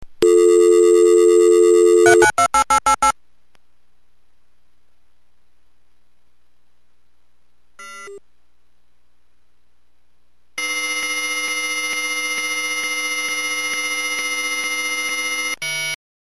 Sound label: bell 103, modem sounds; sm56